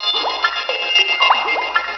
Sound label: AMB BUBBLE; E-MU Producer Series Vol. 8 – Platinum Phatt